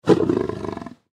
Leopard Roar; Big Cats; Big Cat Sound Effects; Animal

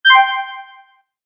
Fantasy Magic Accent; Magic Sound Effects

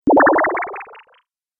Laser Pulse With Delay, Laser Sound Sound Effects

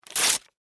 Office Paper Tear Rip; Paper Sound Effects; Paper (Sound Effects:Materials)

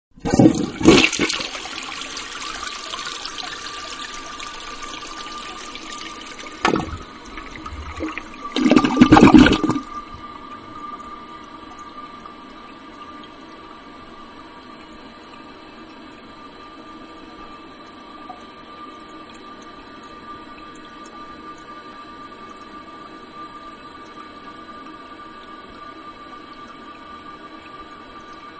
Toilet Flush And Fill; Bathroom; Household; Manhattan Production Music

